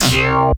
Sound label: SYN, 170 BPM, Drum & Bass; Ueberschall; Drum & Bass Flash Trackz